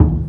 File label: Northline Transit Center Construction Dumpster, percussion; Houston